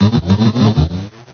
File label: talk